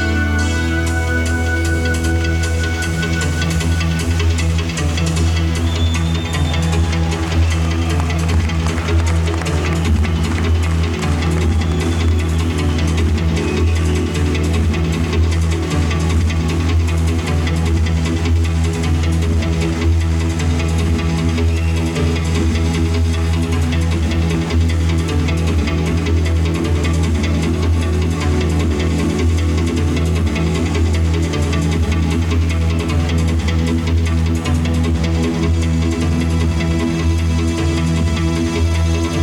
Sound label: Diamond Diary, guitar part